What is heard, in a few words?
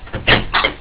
open door; openrsc